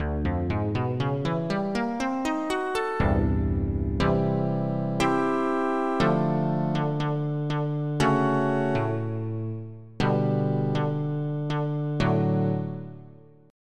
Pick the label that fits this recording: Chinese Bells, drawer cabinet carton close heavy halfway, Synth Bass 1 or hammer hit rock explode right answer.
Synth Bass 1